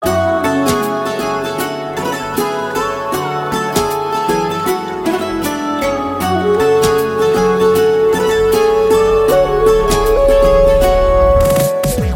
romantic guitar instrumental, ringtone

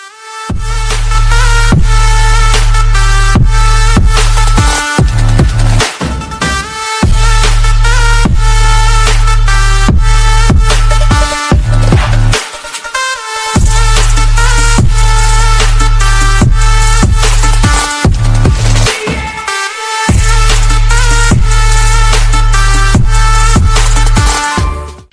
bgm english music ringtone; english ringtones

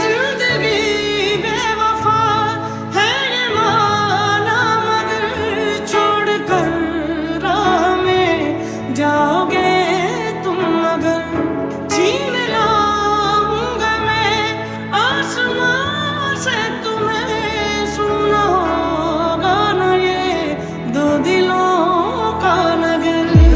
hindi ringtone

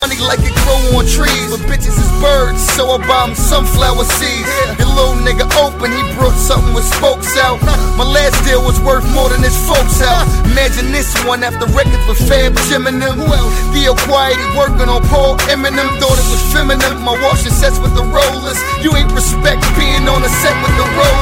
peep game ringtone

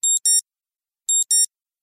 alarm beep samsung ringtone

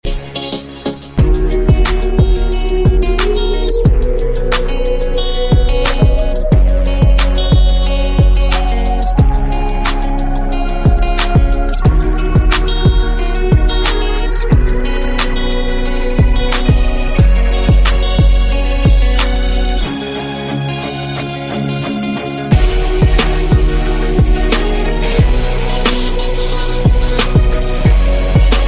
galaxy j8 musical tone ringtone; galaxy ringtones

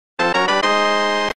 chest fanfare

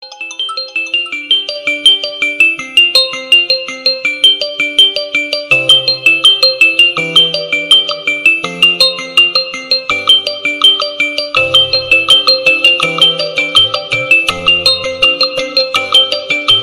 xiaomi redmi note 11s 5g ringtone

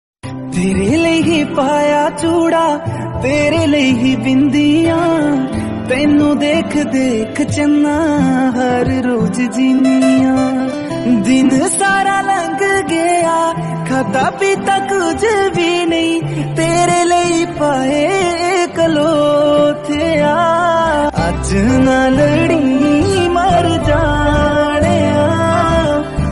romantic hindi song tone ringtone; bollywood ringtones

